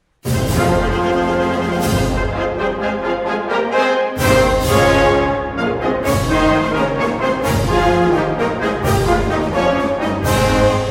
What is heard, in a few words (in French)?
Menuet Pompeux, blasorchester